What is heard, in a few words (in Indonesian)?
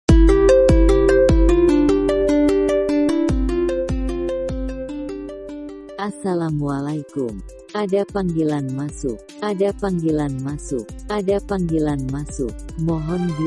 Nada Dering Keren Panggilan Masuk